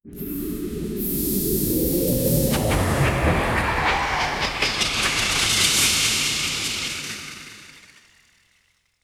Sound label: holy spell full of magic